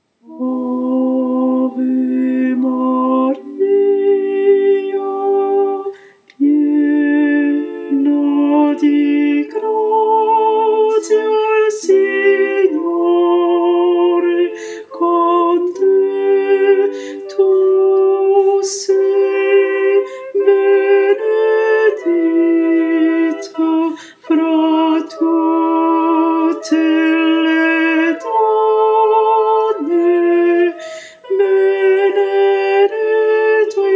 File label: basses; ave maria; Pepi de Marzi